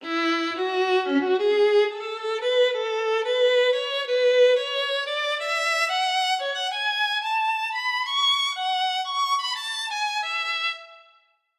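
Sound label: violin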